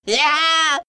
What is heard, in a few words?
laugh; Ratt